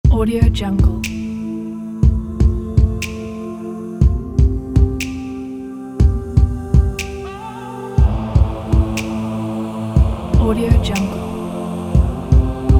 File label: indie vocal